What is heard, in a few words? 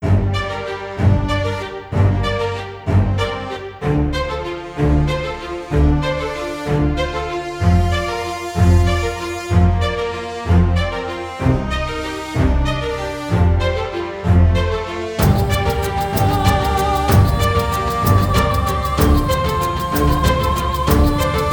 cinematic; by burak sound bank; tension gerilim vol 52